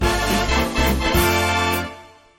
sound Windfall

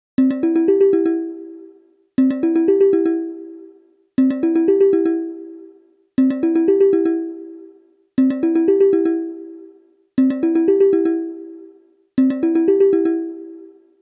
ringtone; Telegram

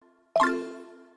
private message

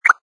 click

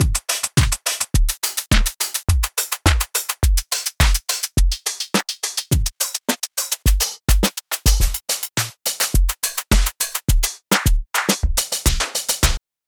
drums

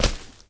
Grass step

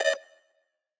Homing Missile Targeting

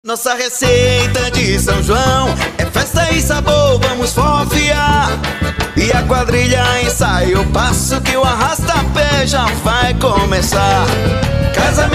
Jingles

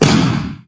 rocket

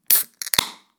brew Can Open; bitfighters

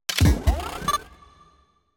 Mine Pickup

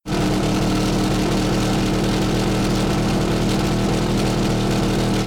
drive Sound

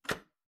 switch high risk; hotline

